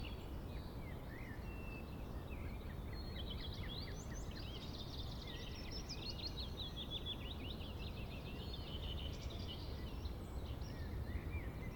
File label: Calm Forest Heathland